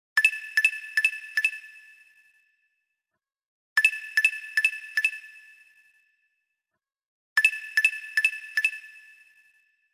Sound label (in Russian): Звоночек